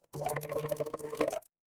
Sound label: water pour